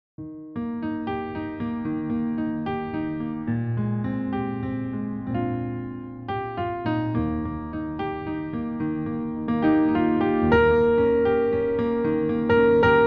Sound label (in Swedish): Vänskapsvisan instrumental